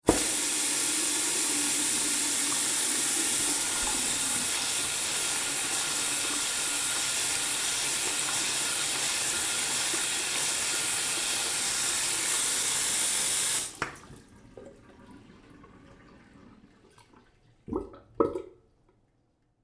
Sink Wash Hands, Foley Sink Wash Hands Public Bathroom On Run Off; Household; Blastwave